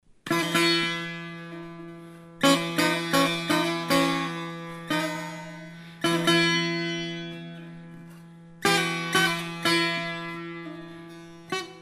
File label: persian setar